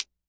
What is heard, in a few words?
hit; tetrio